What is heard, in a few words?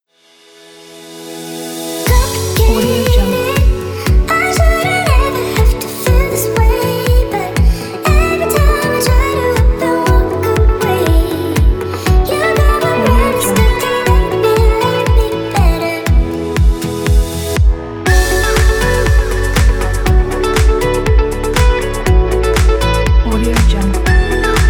uplifting summer pop corporate motivational